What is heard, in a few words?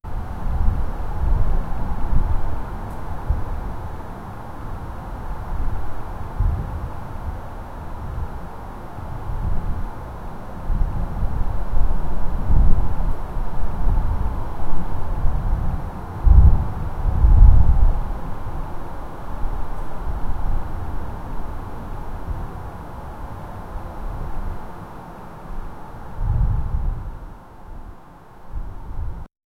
the sounds of an outside, storm